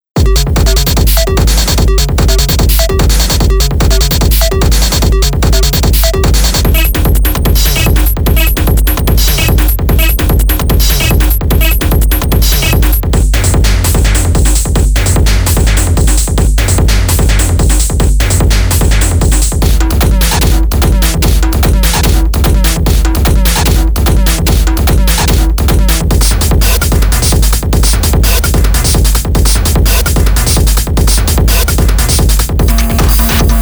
techno loops

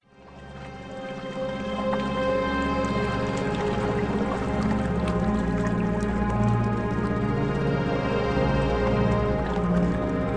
Theta Meditation

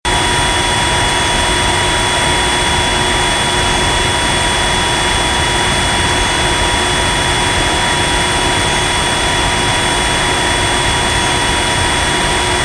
Jet Engine

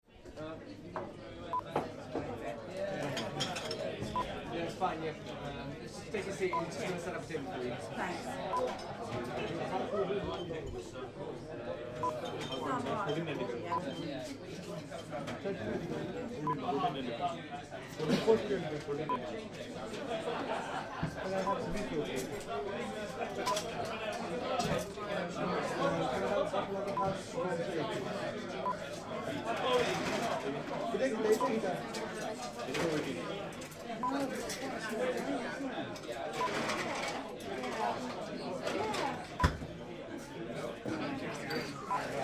Indian Restaurant UK

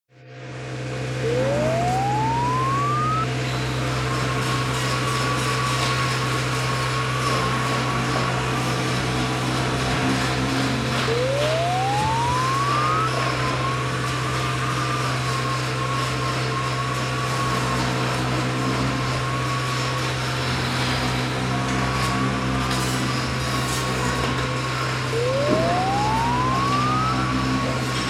Milk Machine and Cows in Farm

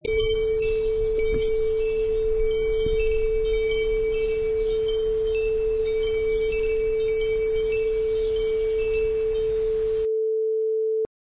Wind chimes Outside

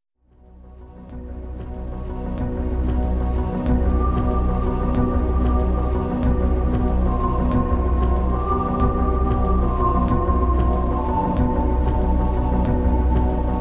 SPACE

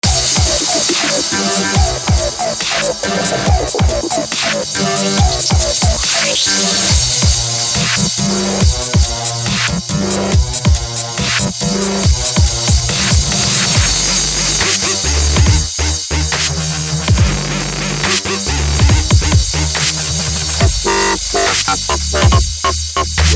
dubstep

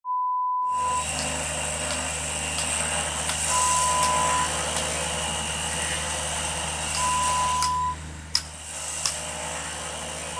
Construction Site sounds

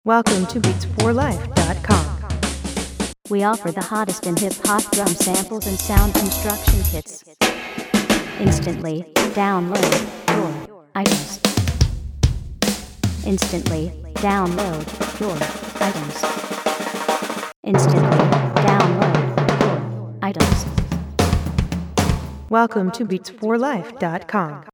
fills

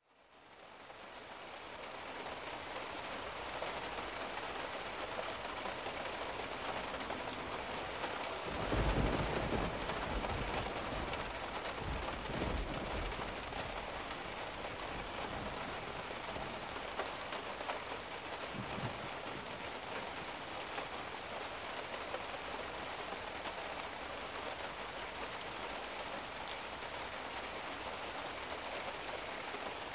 Severe Thunderstorm

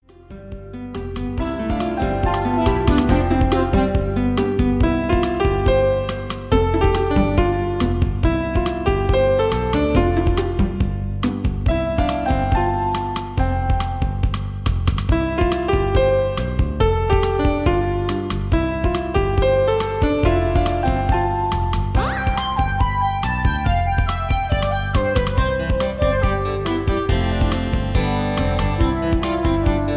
Synth Ballade

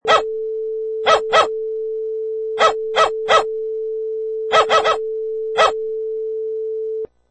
Dog Barking

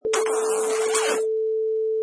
Funny Kiss

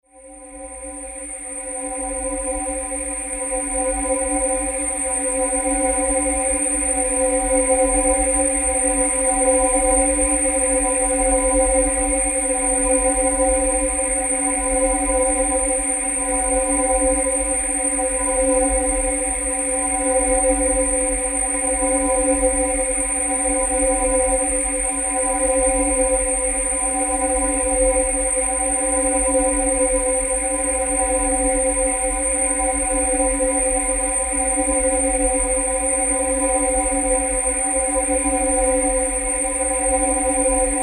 Sci-Fi SFX

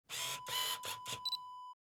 camera focus motor

